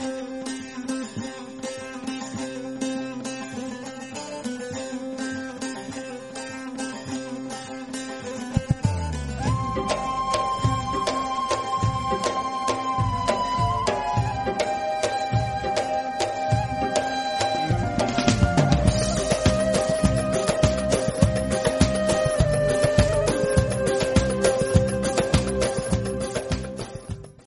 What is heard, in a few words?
turkish